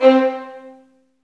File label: STR VNB2, Vlns Marc; Roland L-CD702; Vlns Marc1-3